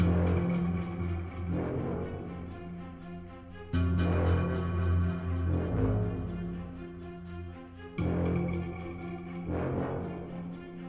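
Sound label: volcano enemy fight; beast quest; ps4; Stonewin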